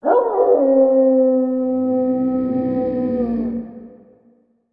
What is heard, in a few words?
jackal howl